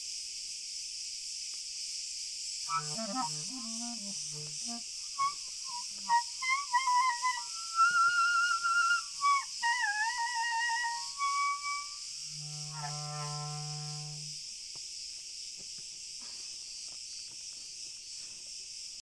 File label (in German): Grillen